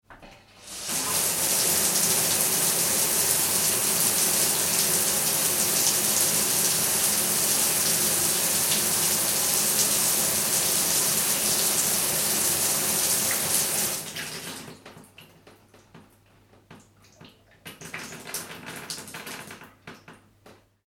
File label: Shower On, Runs And Off; Shower Turned On And Runs For Approx 12 Seconds Followed By Being Turned Off With Dripping; Bathroom; Household